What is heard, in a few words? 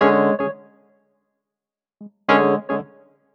105 NuJazz MarkII Bbmaj; Piano; E-Piano; Pro Tools Essential Loops; Digidesign